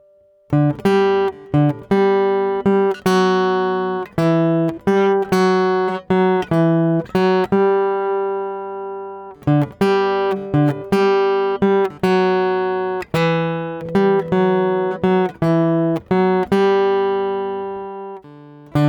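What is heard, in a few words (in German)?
Melodie Ich bin ein dicker Tanzbär; kinderliederquiz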